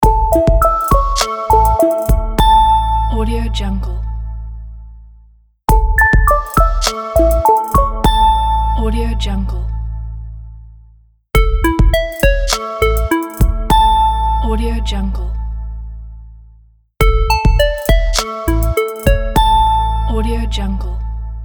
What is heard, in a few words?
abstract clean logo; matsteiner-fx